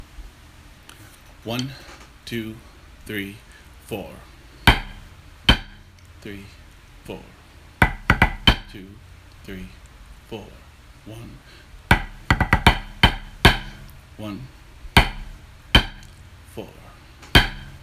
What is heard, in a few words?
Tenor; KOMC Competition Scores; Horsbrugh Castle